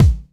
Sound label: present kickdrum d key, kick drum single hit tuned to the D note